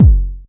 subby steel kick drum F sharp key, bass drum single hit tuned to the F# note; kick drum samples